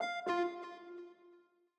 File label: Speech Off, sound scheme; Longhorn 7ista Sound Scheme by David Mounder